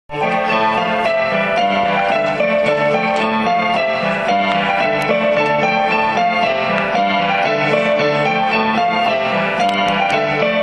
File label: Greek Christmas Carols